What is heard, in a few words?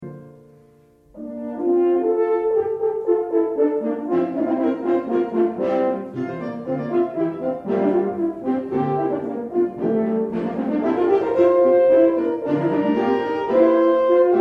Fantasy for Two Horns; Ogontz